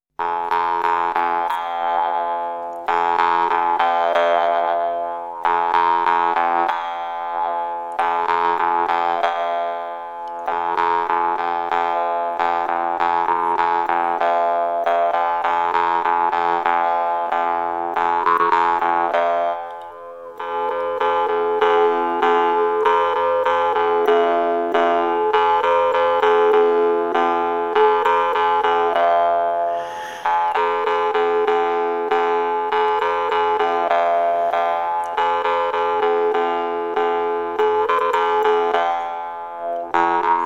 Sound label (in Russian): vargan; Altai; Tuva Mongolia